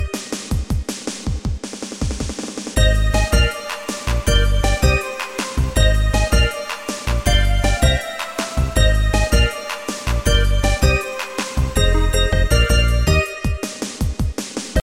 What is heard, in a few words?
Groove